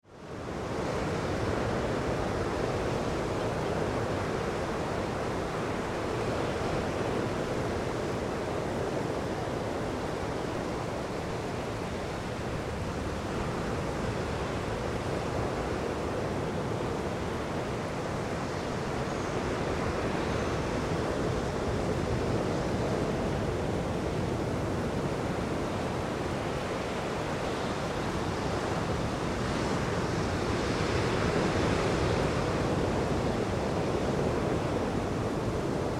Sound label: Ocean onto Reef Distant Perspective, Nice ocean roar